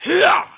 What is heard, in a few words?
troll attack; Halazzi